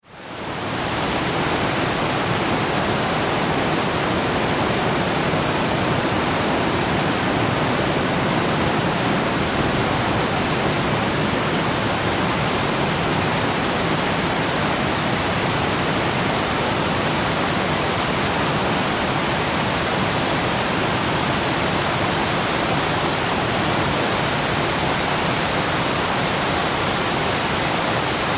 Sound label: Weather Rain Pour On Lake Ambience; SFX Bible 6